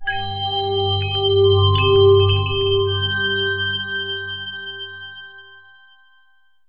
UI collection item

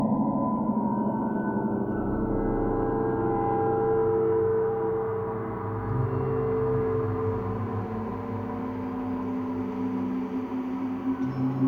Explore Suspense

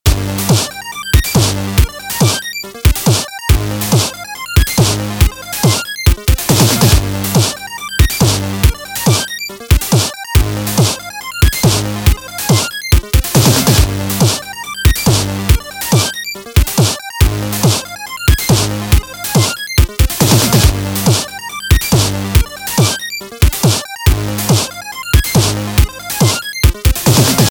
Bossfight Loop; VHSoverdose

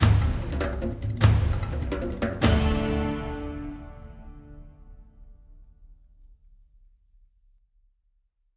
Combat; Badlands

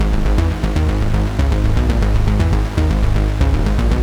bass; Vostok Inc.

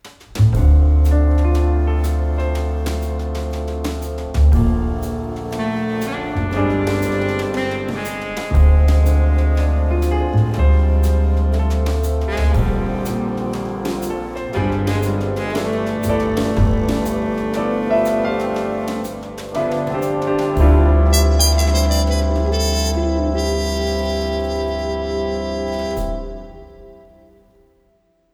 Zombie - Death Song No Synth

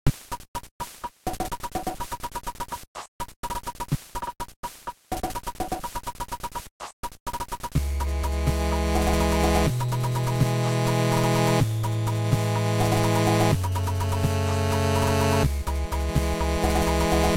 bgm demon tango

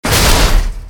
Enemy Helicopter Hitreact; Godzilla Smash3

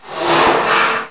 Scrape

